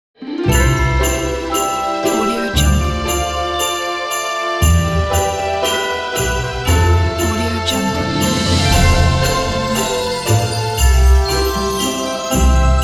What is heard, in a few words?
christmas logo